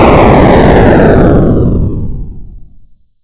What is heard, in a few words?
explo; cavernsoffire